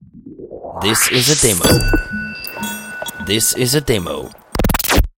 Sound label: Xmas A Dinner Toast